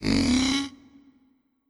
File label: Quetz Stars VO